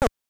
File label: footstep; metroid